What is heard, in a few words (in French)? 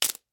Crak Sec; Puddle